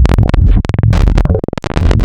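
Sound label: clarinet processed; Adventure Kid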